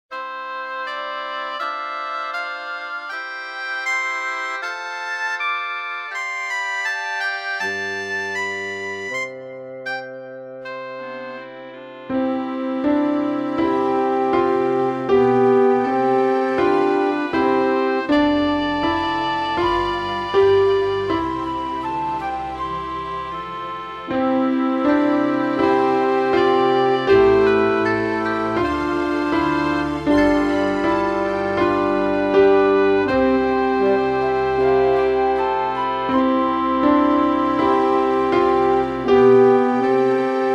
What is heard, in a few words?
Joyful bells; pistas-piano